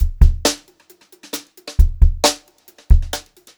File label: beat, Brushed Drum Loops; USB Soundscan vol.39; AKAI; 90 s Sample CDs; 133 HOTROD